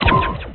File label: ice bolt; Undercroft